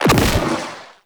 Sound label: Space Fighter Dredger Jelly Rocket Fire; Grineer